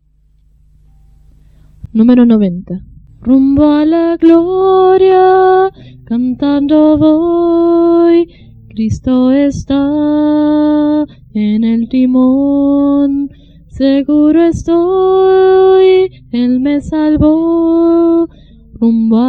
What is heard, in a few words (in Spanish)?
Coro, himnario niños